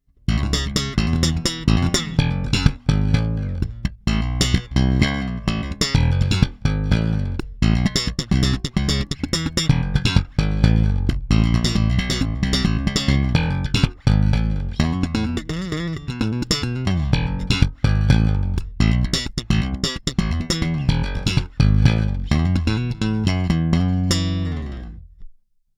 Fodera Slap

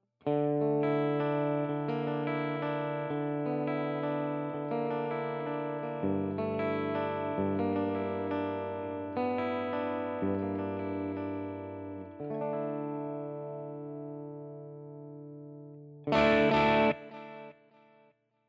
Line 6 Catalyst Chime DI Out

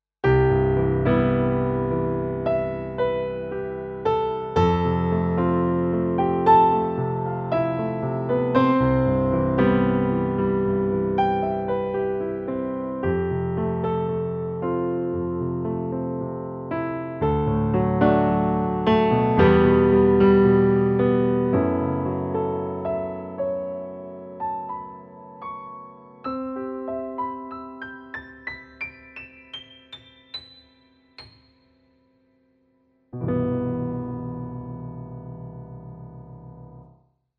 Kawai MP7SE SK Concert Grand

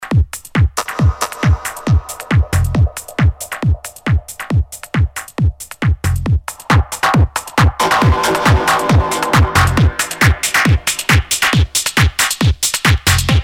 Fuzz

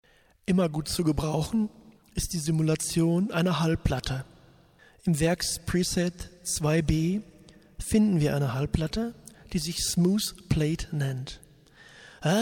Preset Plate

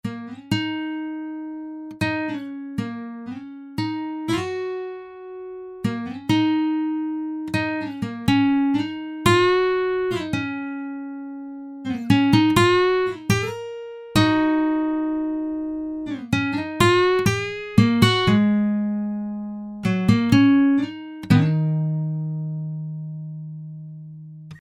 Solo Steel Pick Slides

